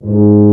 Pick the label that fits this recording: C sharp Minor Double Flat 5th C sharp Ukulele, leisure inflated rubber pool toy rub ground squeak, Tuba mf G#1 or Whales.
Tuba mf G#1